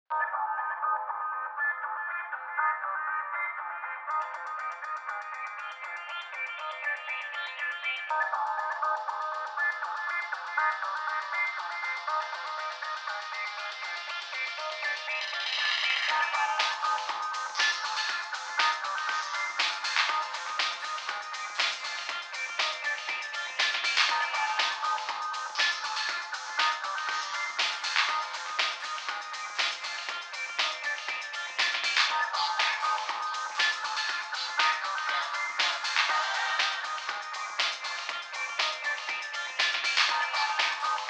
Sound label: end music